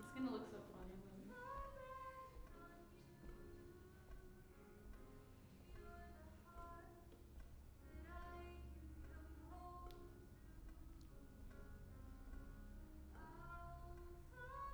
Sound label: violin